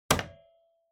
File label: Intercom Door Station Button